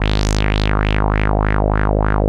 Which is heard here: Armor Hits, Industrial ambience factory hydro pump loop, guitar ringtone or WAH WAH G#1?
WAH WAH G#1